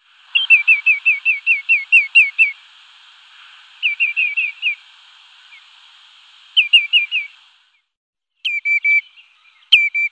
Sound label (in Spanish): archibebe comun